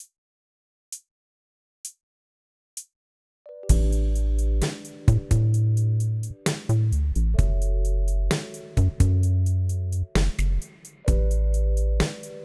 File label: Piano